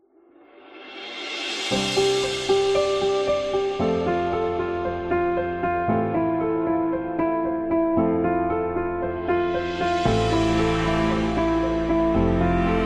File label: Wedding Music; corporate background